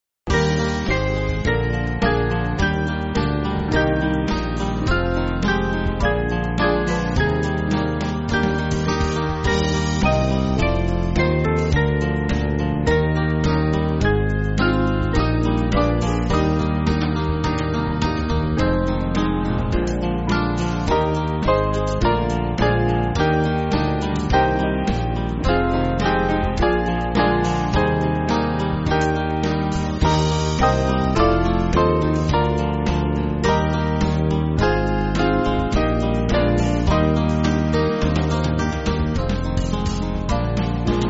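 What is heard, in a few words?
Band, Backing Files for Churches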